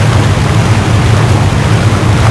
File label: fall; temple2